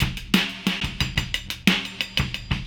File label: Process Beat, Hip-Hop Beats